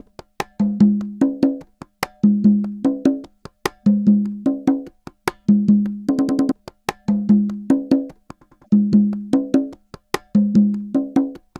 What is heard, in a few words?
148 perc loop congas swing richer